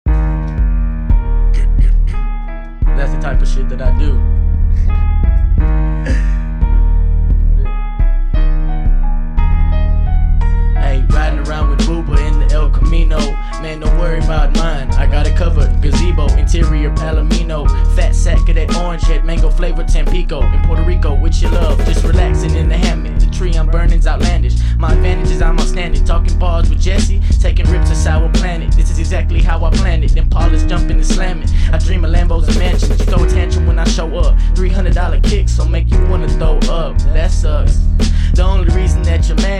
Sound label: camino music